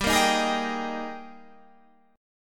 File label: Ab Major 7th Suspended 2nd G, Guitar